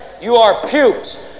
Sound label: PUKES